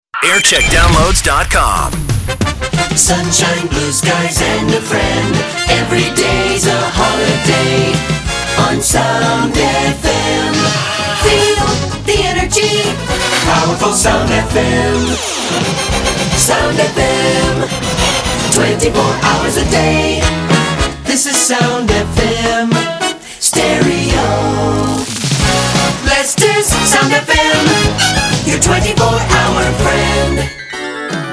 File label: Jingle Montage; soundfm